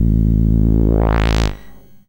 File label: SID Bass Am, chip; CHIPSHOP HITS